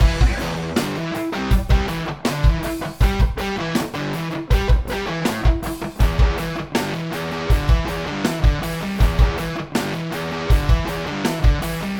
Drums Gtrs Reverb

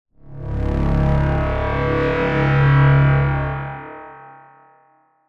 Cinematic Swell; Native Instruments; Massive X

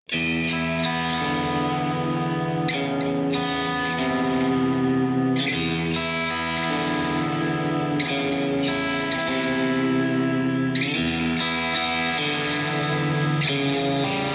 Crunch Sound preset; Omnyss; Midnight Rain

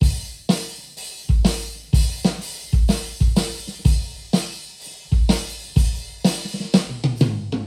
Drums Re-Mic

